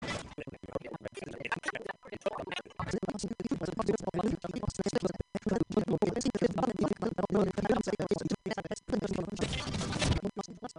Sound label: Peace Drums